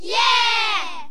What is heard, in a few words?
children yeah